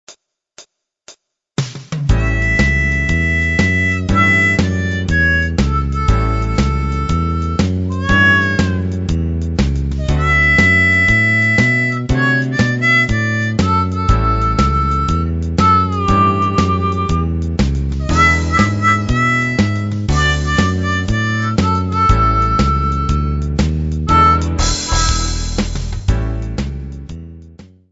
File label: harp